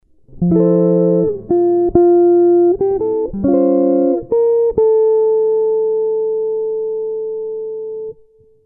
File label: chord melody guitar